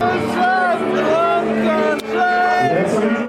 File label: bongo